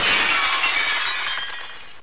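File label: glass break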